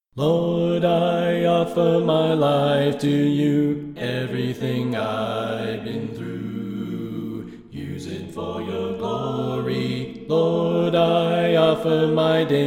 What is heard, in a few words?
Bass Left